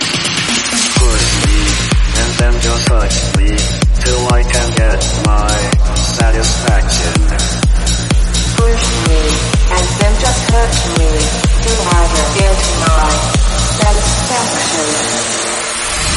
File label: Remix Slam End 126bpm Bbm